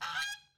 parrot quack; battle

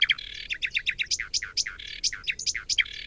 whistle; World Of Goo

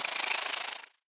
mech spider web shot; battle

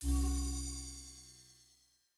ferro spot release

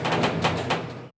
lift noises; The Swapper

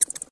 batsqueak; World Of Goo

